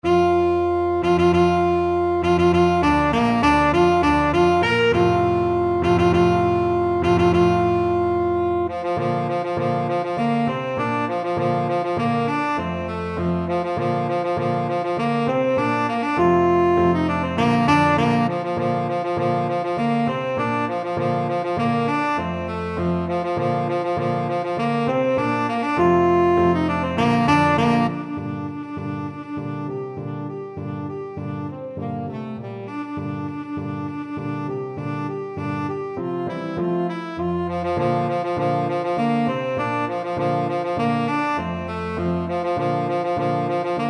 tenor saxophone

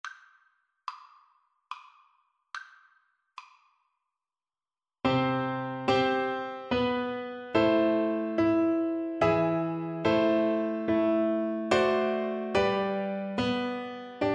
horn, French Horn

